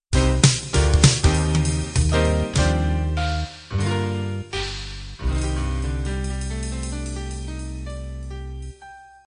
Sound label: bop end